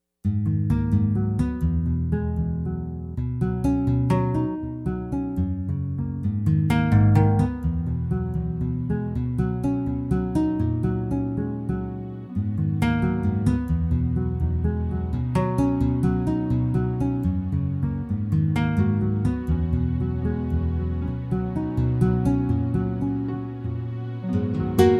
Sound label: Guitar Music